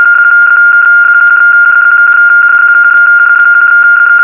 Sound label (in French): BPSK31, Phase Shift Keying 31 Bauds